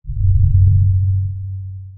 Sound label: sonar Ping Air Far Beacon; Barotrauma; Real Sonar